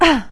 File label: pain